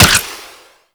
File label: Flare Launch; Archwing